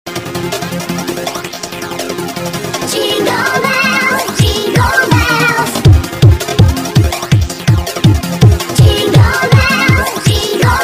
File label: jingle bells